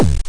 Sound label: Hit; AeroStyle